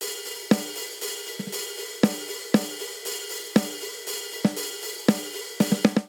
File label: Straight Three Rock Snare & Hihat 3/4 118 bpm; MIDI Clips; Drum; Ableton; Factory Packs; Drum Booth